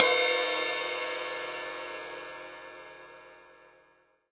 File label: ride; DirectX SDK; DMusProd